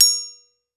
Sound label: PUTTY, Music Box